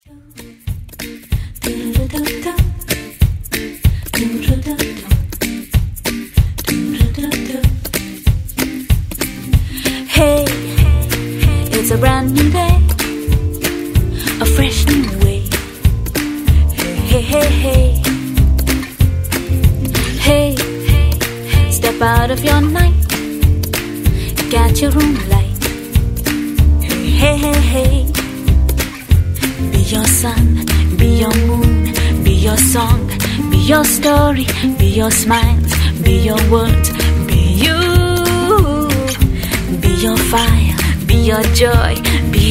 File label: TV ad theme Anthem song